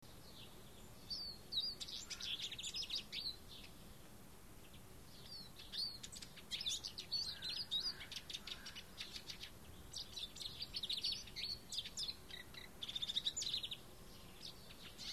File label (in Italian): canto lucherino